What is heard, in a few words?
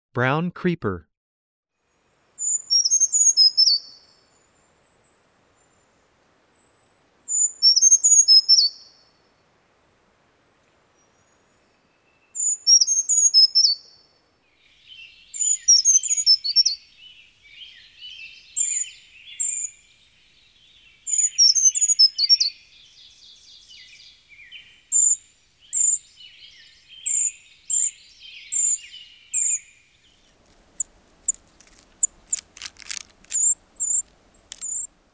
brown creeper